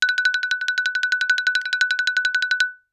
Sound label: Xylophone rosewood roll ff Gb6 stereo; MIS Pitches - 2014; Percussion